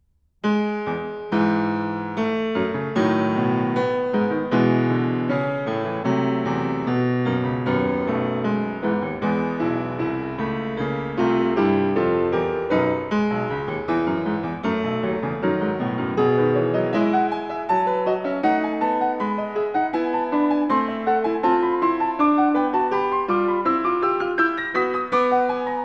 Piano Sonata No.30 in E major, Op.109 Variation V- Allegro, ma non troppo; Beethoven; The Piano - Legendary Recordings